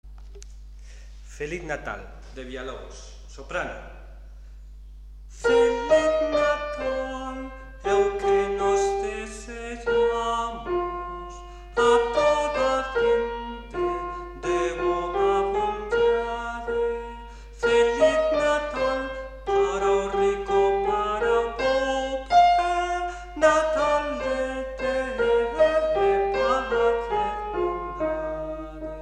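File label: soprano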